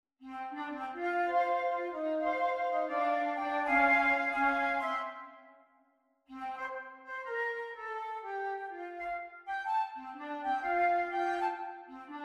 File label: flute, flute quartet; here we come a wassailing